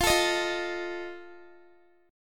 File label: F 7th, Guitar; x,20,19,20,17,x; 4E,4A,5D,5G,5C,6F; strummed